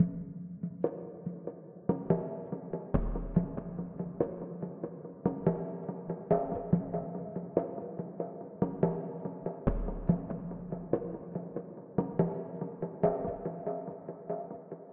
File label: Djemba